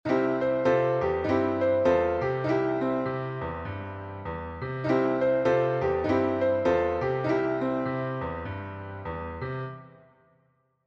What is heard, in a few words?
turn, blues piano